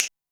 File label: snap, Zoom MRT-3B